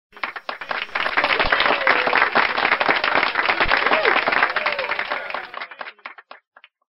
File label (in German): Strassenmusik; ab Mitte Takt 20; Traumbilder